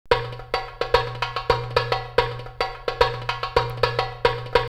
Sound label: Rabat 108, drumloops World & Ethnic; X-Static Goldmine